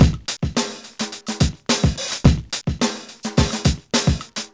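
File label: 107 bpm, Funky loops; Zero-G; Funky Elements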